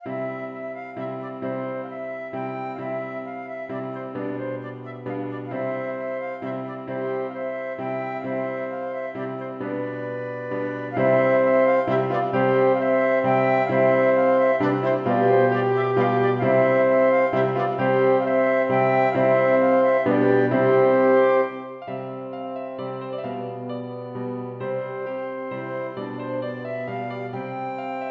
to fløyter, horn og piano; Bang; La ere de Malte; To arrangementer fra Jacob Mestmachers notebok som er skrevet meget enkelt for hvert instrument

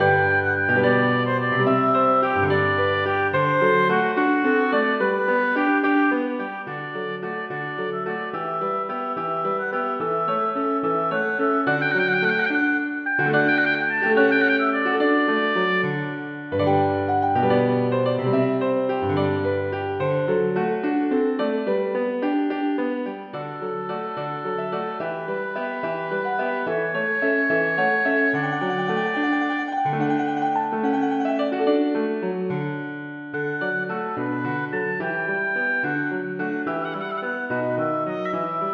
Gigue for obo og piano; Aamodt

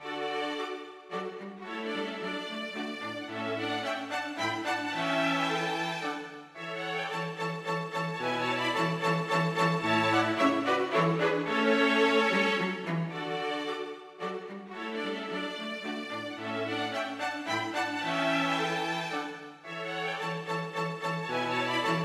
Menuet for strykekvartett

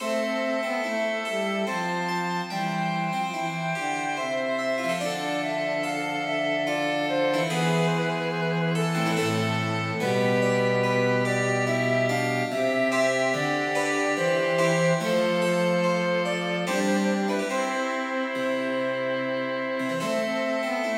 Double for fløyte, fiolin, cello og cembalo

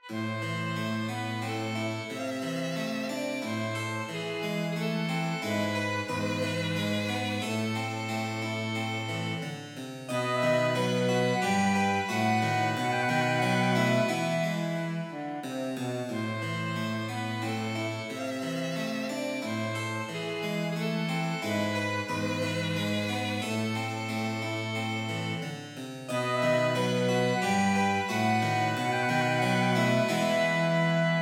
Saraband for fløyte, fiolin, cello og cembalo

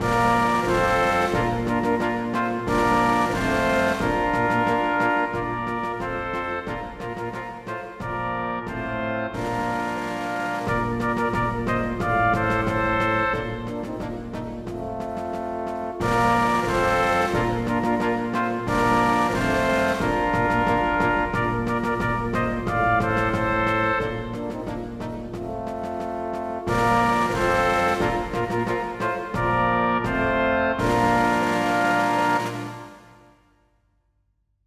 Messingkvintett ad.lib. trommer

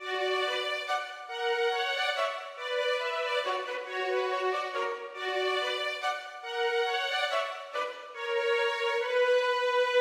Menuet for fiolin duett; Aamodt

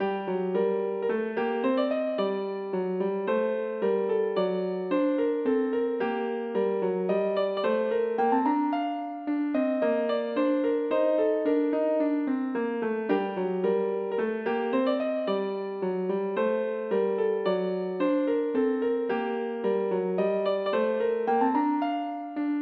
Menue for piano; Bang

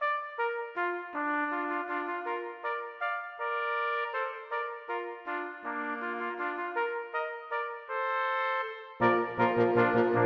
Menuet for messingkvintett; Aamodt

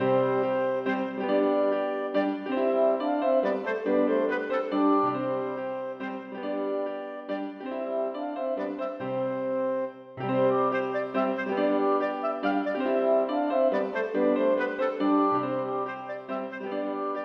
Menuet for klarinett, horn og piano; En samling ganske enkle samspillstykker fra 1700-tallet; Aamodt 014j

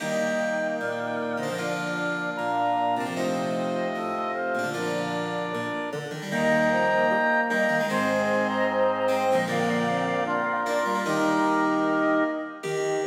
Air for blåsekvintett med cembalo; Hertz

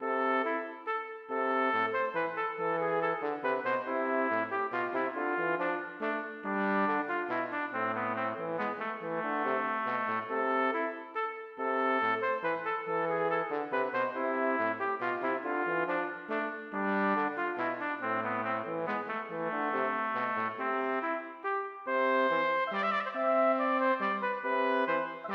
Menue for trompet og bariton; Bang

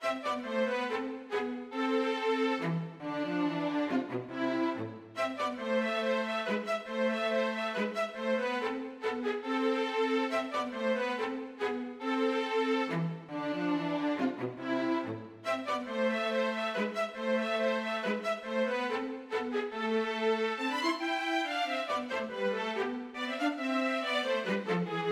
Menue for fiolin og cello; Bang

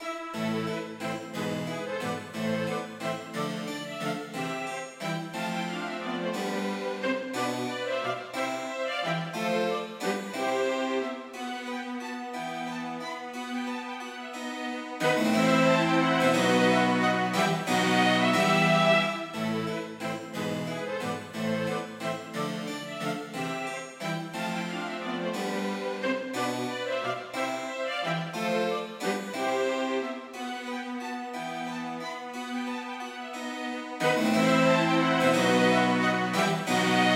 Gigue for strykekvartett og cembalo, med cembalo; Hertz

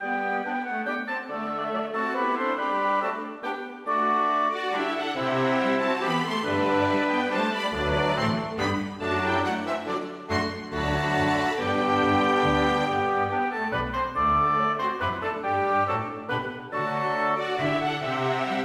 Menuet for kammerorkester

